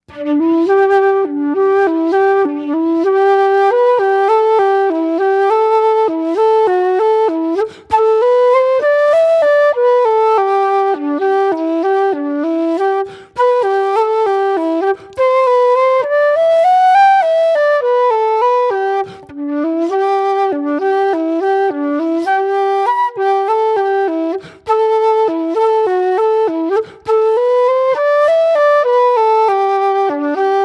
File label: Slowest; Kilmaley